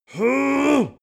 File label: male exert; menschen; koerpergeraeusche; soundpool